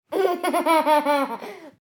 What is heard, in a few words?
baby laugh 18 months